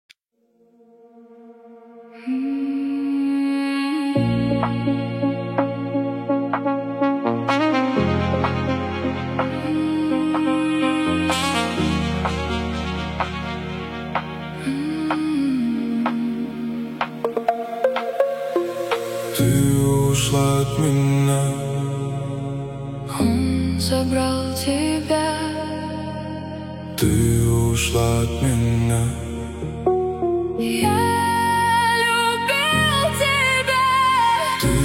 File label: deep house remix